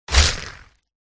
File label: skolopendra attack contact mid